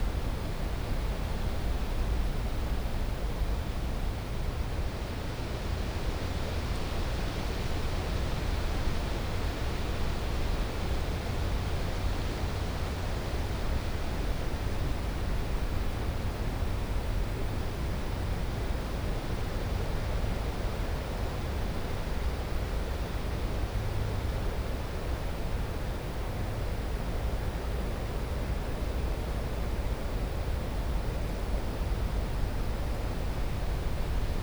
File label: AMBIENCE Forest Wind Tree Creak loop stereo; gamerip; the looker